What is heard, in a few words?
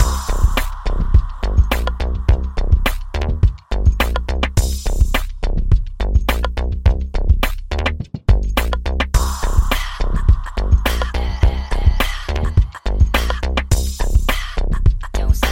Game Over (Upbeat); Friday Night Funkin; Funkin Physics; Friday Night Funkin vs. Trollface; Trollge